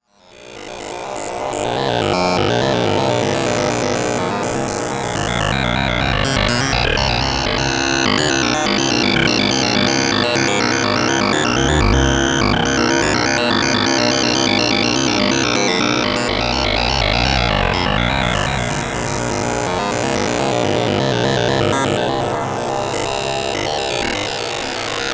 Xaoc Devices Leibniz Binary Subsystem Wavetable Bank 5 asynchronous